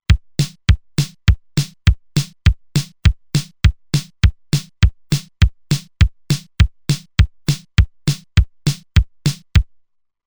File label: March, Roland TR-505; Factory Rhythms